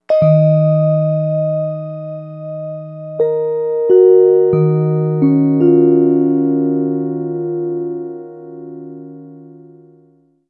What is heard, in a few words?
Bell